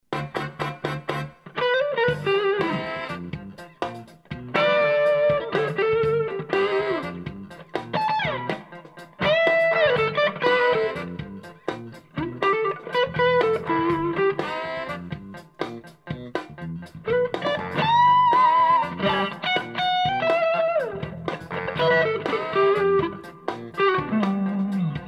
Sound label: funky, guitar